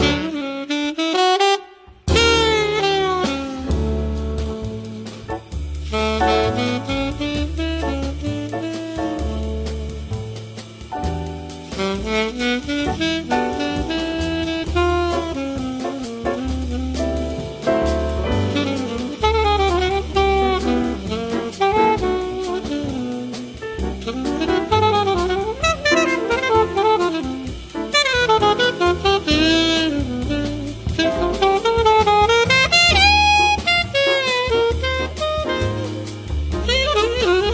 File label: Swingin The Samba